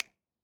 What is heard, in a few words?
Snap